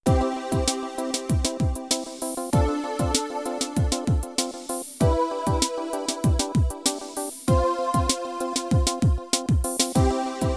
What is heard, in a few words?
Continue; Brian the Lion; CD32 Amiga